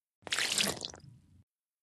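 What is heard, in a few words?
Small Liquid Spill Carpet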